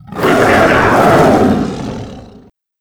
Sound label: death